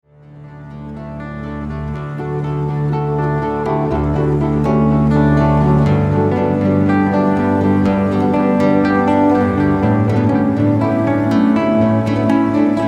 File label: Trance